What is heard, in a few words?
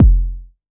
dope kick drum single hit f key